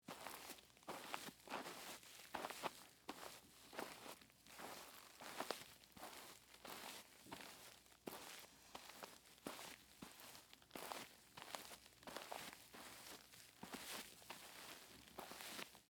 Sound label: Hiking Boots Walk, Footsteps, Hiking, Boots, Walk, On, Earth